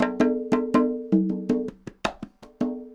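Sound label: congas bongos 80 bpm, latin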